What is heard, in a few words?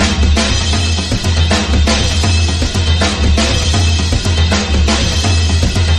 drums; horde